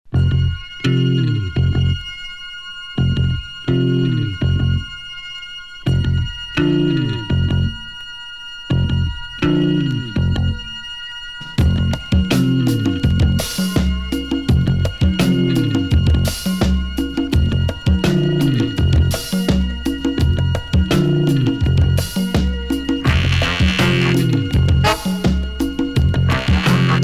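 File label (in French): orchestre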